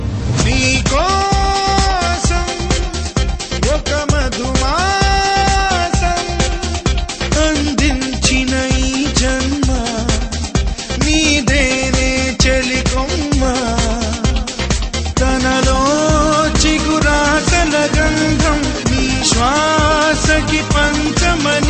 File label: telugu romantic